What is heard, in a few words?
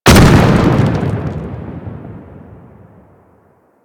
Pillar Explosion; World On Fire; Warframe; Ember